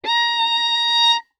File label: Violin arco ff sul D Bb5; MIS Pitches - 2014; Strings